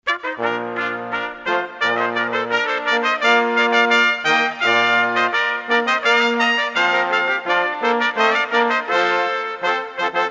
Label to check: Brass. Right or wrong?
Right